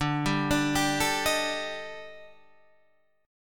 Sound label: Eb Major 7th Flat 5th D